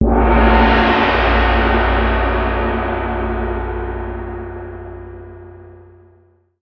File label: Large Gong